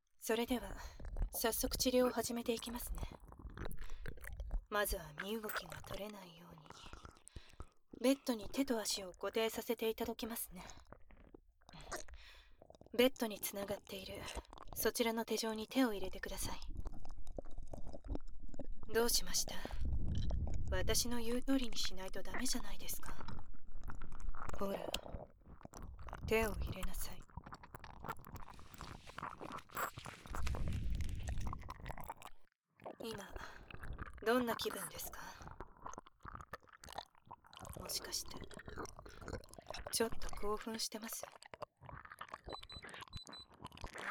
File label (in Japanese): 耳舐めされながら低音クールなドスケベナースに手コキ・乳首舐め・フェラ・前立腺マッサージされる音声